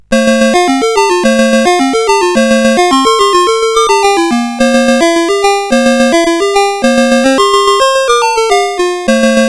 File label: Quari, CSG8580R5